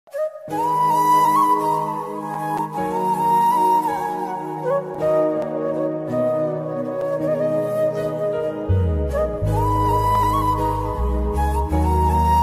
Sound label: song bgm tamil bgm tamil ringtone bgm